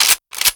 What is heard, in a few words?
Shotgun Underlay Play